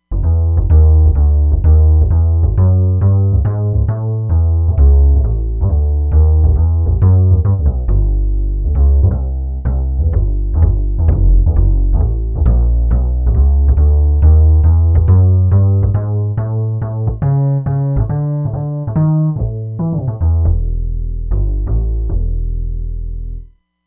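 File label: Downright Bass, Roland Fantom XR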